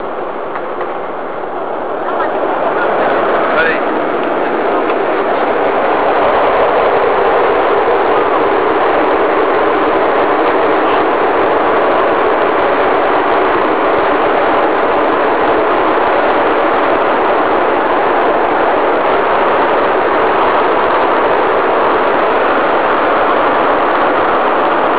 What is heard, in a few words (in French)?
mise en regime